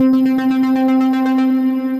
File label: Vivid Synth Dreams, Synth Pad; Ableton; Factory Packs; Synth Essentials